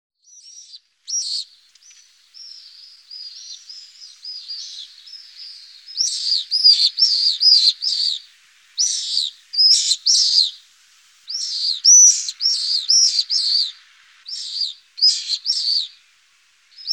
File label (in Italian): rondone pallido